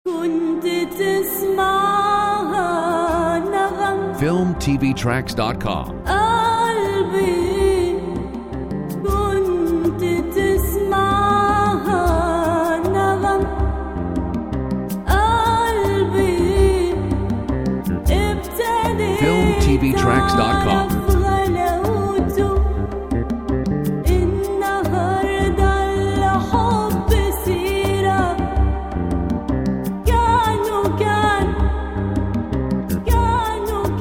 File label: Arabian Chillout